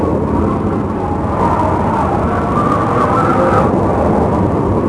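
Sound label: wind heavy; envrn; The Elder Scrolls III Morrowind GOTY; XBOX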